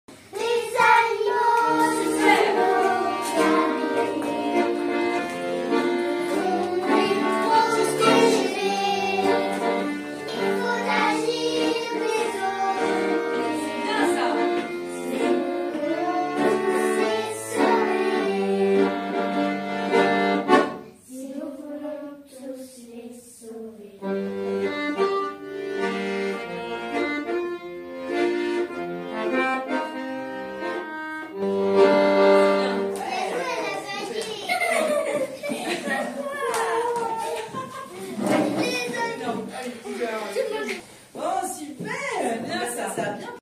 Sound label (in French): Atelier vocal enfants